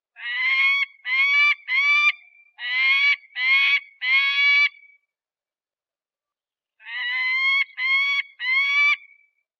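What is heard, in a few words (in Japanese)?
hayabusa call, peregrine falcon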